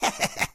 Victim Miss Male

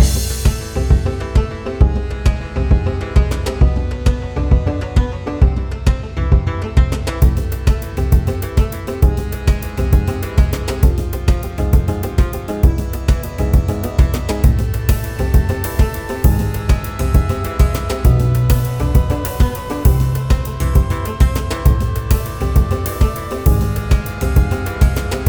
Swamp Combat; barony

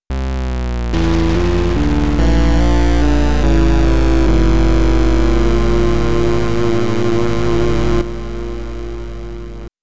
Defeat (Chiptune); MazeQuest 2 original soundtrack 2018

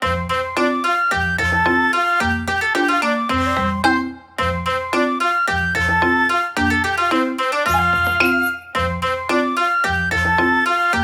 Rhythm

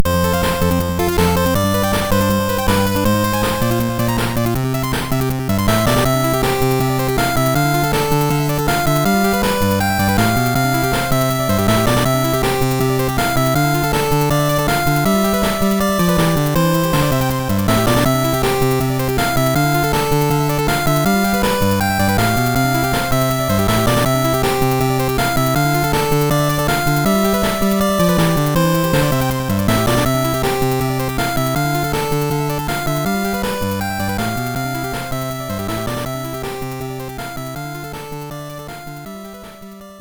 Battle Victory; oni ii innin densetsu; gb

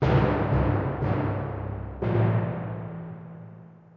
Tymp

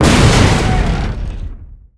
Explosions Destruction of War Machines

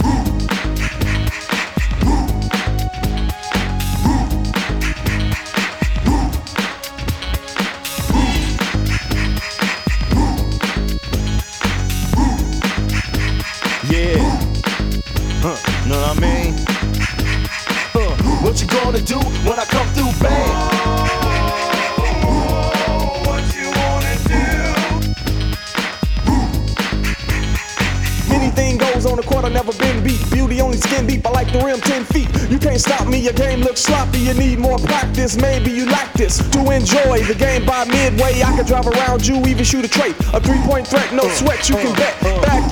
Rap; NBA Hangtime; PS1

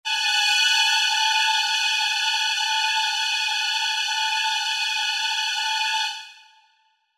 strings swell high suspense

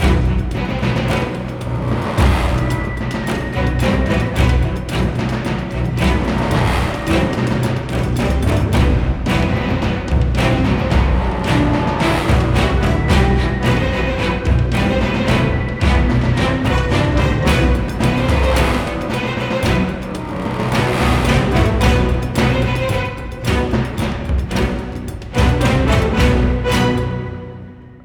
Flightdeck Orch; Crysis

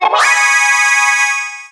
Mystery Note

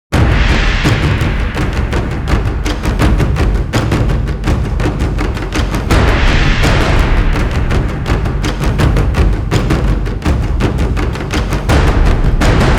Low Intensity Action Loop Percussion Only; battlefield bad company 2

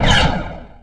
Laser Shot; xbox 360; gamerip; castleminer z

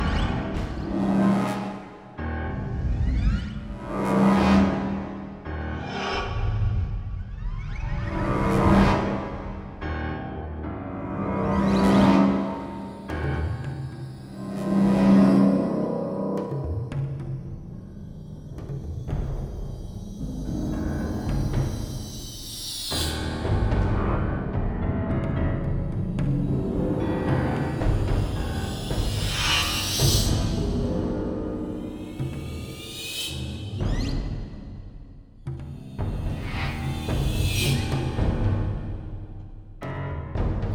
Alien Jungle; Crysis

